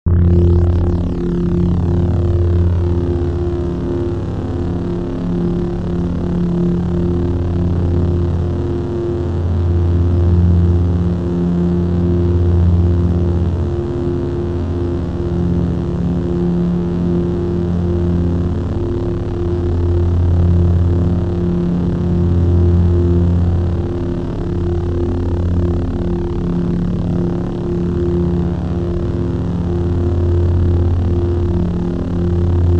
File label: Saber Drone; FXHome